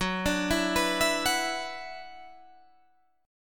F sharp 7th Suspended 4th Sharp 5th, Guitar, Half Step Down